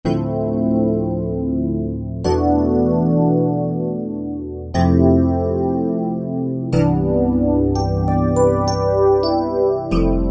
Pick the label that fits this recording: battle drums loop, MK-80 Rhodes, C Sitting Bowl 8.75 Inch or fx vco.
MK-80 Rhodes